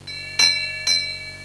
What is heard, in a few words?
ship, Bells